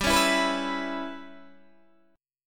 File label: G 6th Flat 5th, Guitar, DADGAD